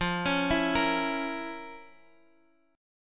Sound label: F Major 7th Suspended 4th Sharp 5th, Guitar, Open D; down; chord shape